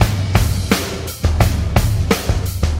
115 bpm breakbeat g key, breakbeat sample - kick tuned to the G note. Loudest frequency: 1422Hz